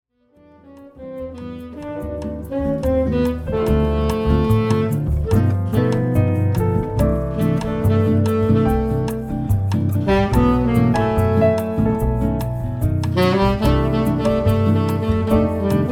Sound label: Meditation, sax